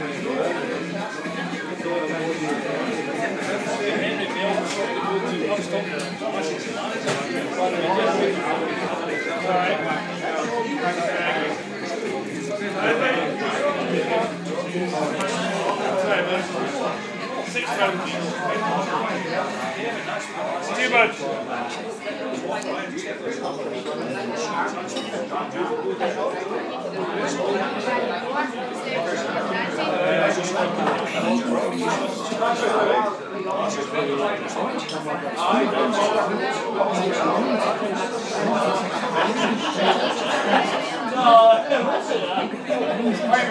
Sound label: scottish pub